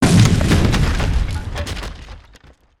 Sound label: Bomb Crate; destructibles